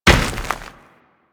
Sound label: Step Dirt, Footsteps; Warframe